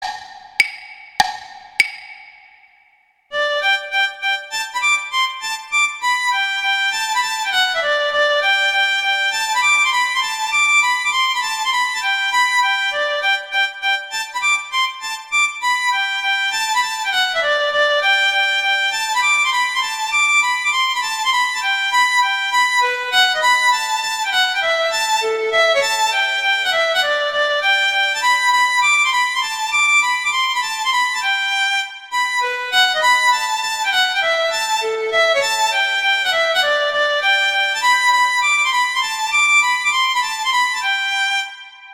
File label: Allegretto Guitar; Paganini; Sonatas Op1 Vl Gu; Sonata No 2